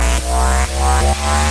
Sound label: droid phone machine